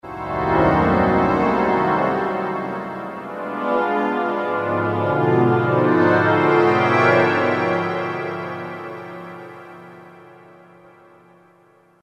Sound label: All Diminish Chord, Roland PN-D50-04